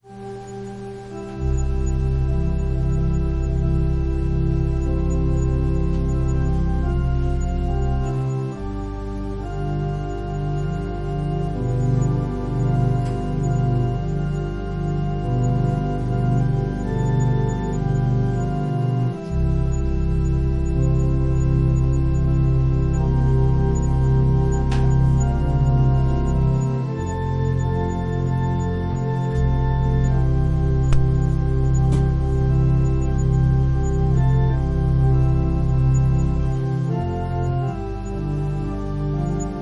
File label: Organ and violin prelude